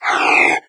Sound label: chatter, hazmat zombie; doom3